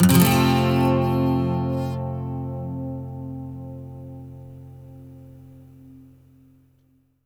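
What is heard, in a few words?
12 string major, Acoustic Guitar; Electric & Acoustic Guitar Loops; 12STR SWP; USB Soundscan vol.04; AKAI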